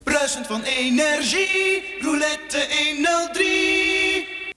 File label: silence